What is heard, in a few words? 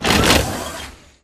Sentry Turret Leg Servo; Boost Card; Titanfall 2